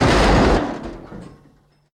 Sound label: damage vehicle; World Of Warships